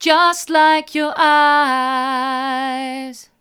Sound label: hard, vocal